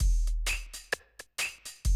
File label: 130, Classic DrumLoops